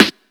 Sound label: airy sizzle snare drum g sharp key; snare drum samples